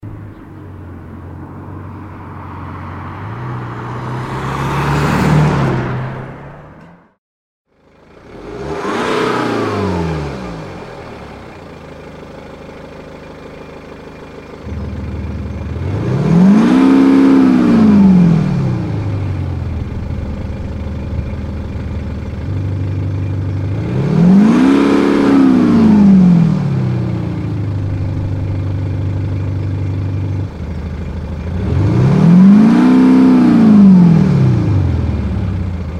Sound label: Sound Booster Bmw 4er; KUFATEC